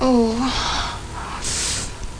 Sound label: oooh!